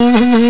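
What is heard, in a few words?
didgeridoo; CMI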